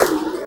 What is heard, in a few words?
FX Dance